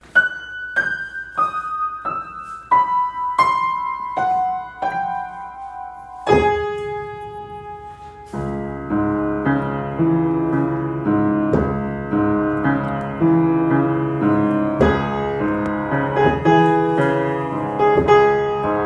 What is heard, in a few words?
Victor Solo